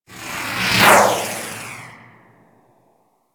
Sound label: Beam Gun Loop One Off 3D E, weapons; Player Path Beam; Fomorian